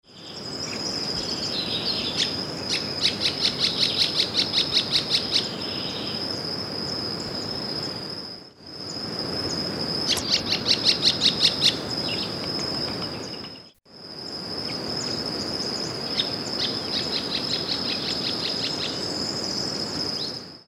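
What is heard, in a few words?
Carpintero Oliva Yungueño, Dot-fronted Woodpecker, Verniliornis frontalis; cantos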